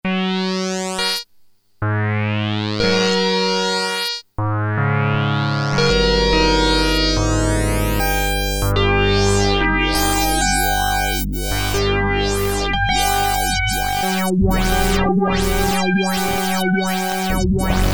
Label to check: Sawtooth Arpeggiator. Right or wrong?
Right